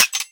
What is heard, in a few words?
Sentient Pistol Mech; Warframe; Amalg